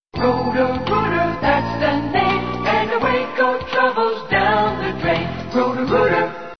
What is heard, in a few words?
Jingle; Roto-Rooter; Roto-Rooter Sewer Drain Cleaning Madison / Janesville - Dane / Rock County WI